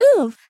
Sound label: Oof; Neru; SM64 CO-OP; Triple Baka Pack